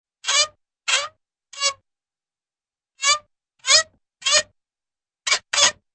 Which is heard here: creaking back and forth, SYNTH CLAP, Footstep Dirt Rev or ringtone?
creaking back and forth